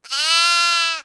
bah; devour

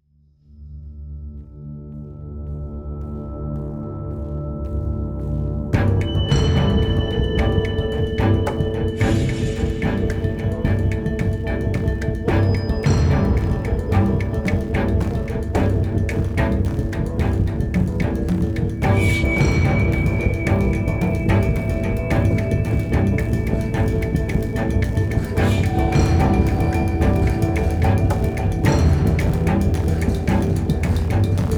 Action Loop

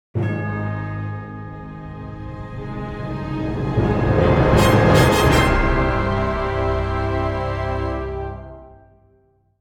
victory jingle

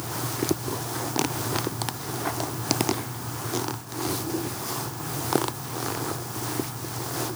walk foley loop

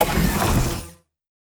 open hatch

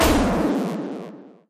UI CLEAR DATA; Dino Shift 2

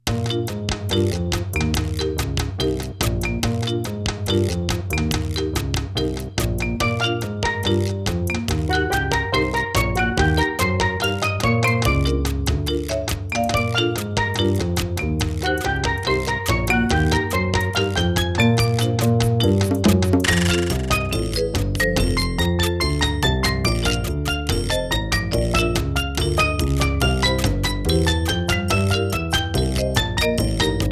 Marimba; Freddi Fish and the Case of the Missing Kelp Seeds Remastered Soundtrack 2021

